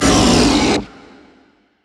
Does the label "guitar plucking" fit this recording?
No